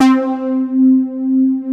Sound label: POLYSOFTS, Synthsonic, DANCE; Z1; Pro-Rec